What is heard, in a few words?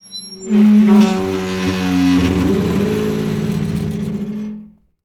opening large iron door